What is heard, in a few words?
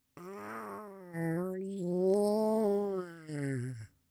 oh yeah duck